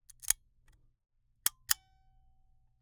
Camera, Argus, aluminum, steel, professional, fully mechanical, advance film, snapshot; Eiravaein Works; Vaeyan IV